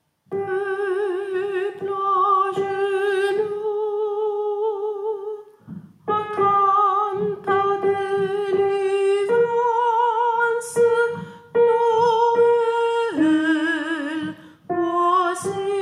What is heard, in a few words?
Cantique de Noel soprani; natale